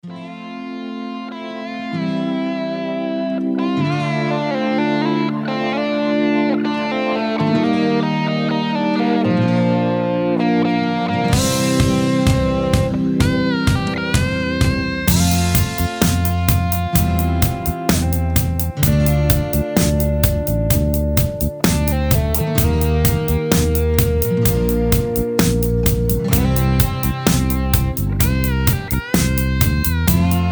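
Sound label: Instrumental Low Leads Full Solo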